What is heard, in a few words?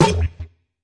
fx drum, Electro FX